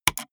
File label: gaming mouse left click single fast hard